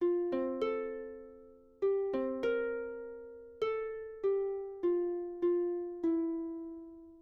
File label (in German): Rhythmus Ubungen; Notenlesen lernen